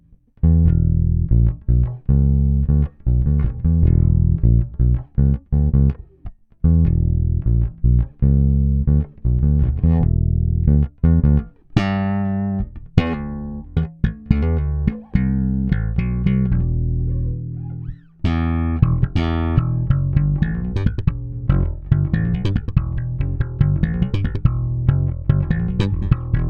eq comp; pendulum; quartet

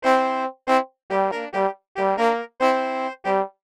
section reggae, brass; arturia

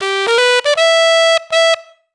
jazz sax; arturia

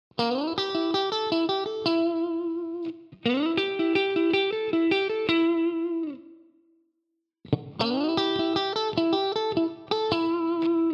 mellow strat sim analog; line6; tonecore; simulanalog

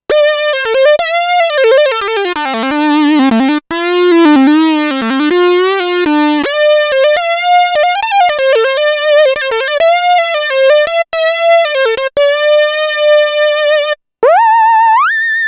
mini lead; Minimoog Voyager; leads; arturia origin

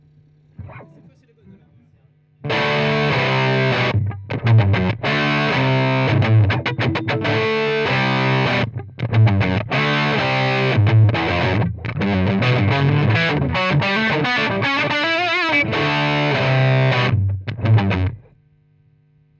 disto el34; brunetti pirata141

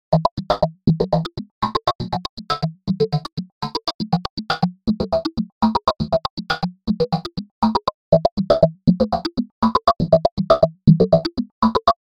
rythm; propellerhead; reason4

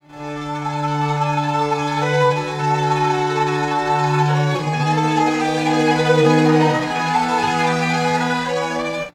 12, strings, pitchshift; apple logic pro complex

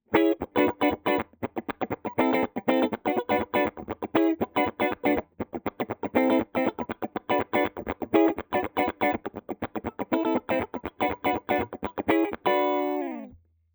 clean middle funk; vintage

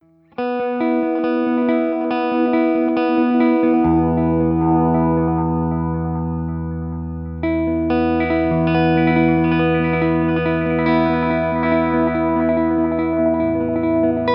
sweep echo; line6 spidervalve; effets

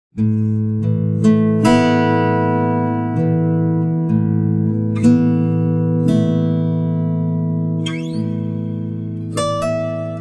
200, timestretch, guitar; serato pitch n time le

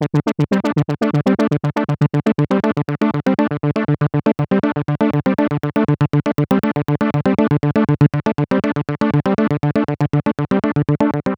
enveloppes, waldorf blofeld

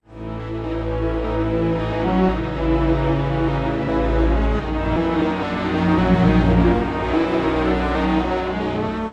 5, strings, pitchshift; celemony melodyne

